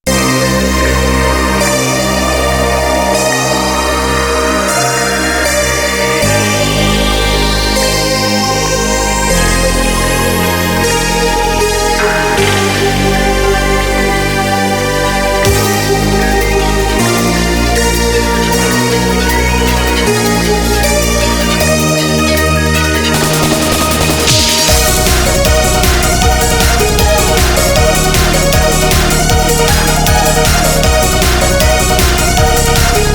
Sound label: 60's wind - stars, instrumental